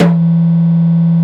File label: Taiko Drum